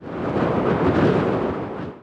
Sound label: wind